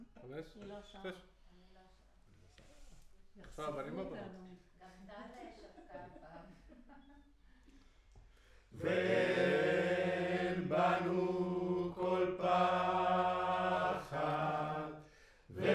Choir

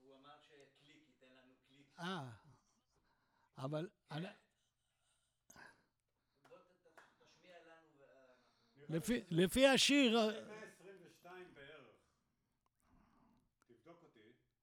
VOC

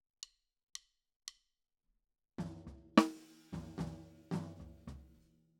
Snare Top

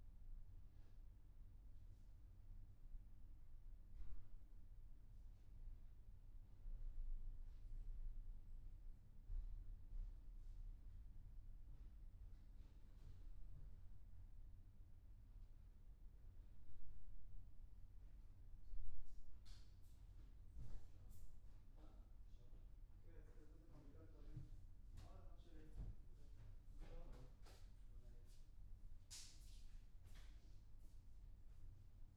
Piano Room

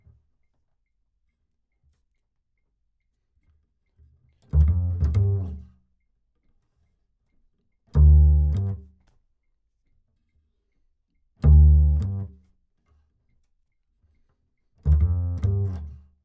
Bass

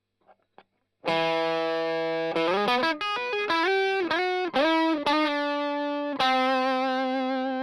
Strum Line

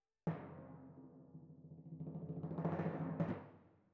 Timp Close

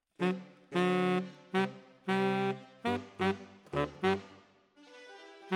Tenor, RNR for piano

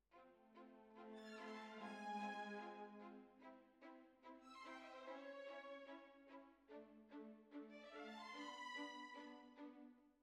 Flute

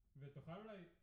Piano Mono

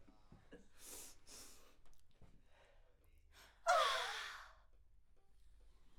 Backs AKG LEFT

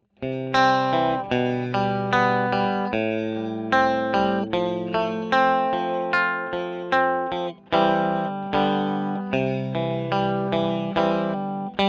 E.GTR Royer

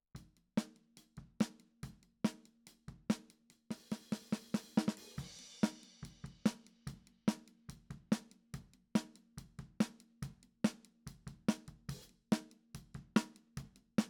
Snare Top

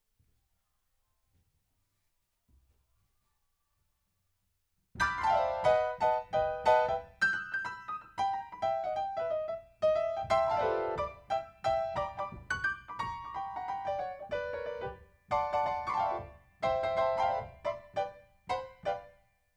PIANO

